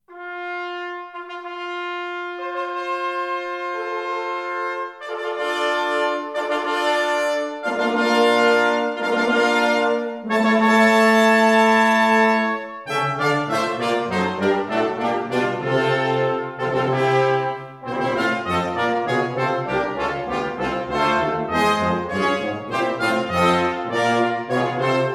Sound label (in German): fanfare